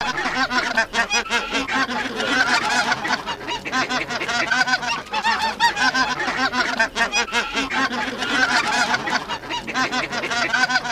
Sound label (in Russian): Белолобый базар Oche Lombardelle